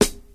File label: high quality studio steel snare drum sound g sharp key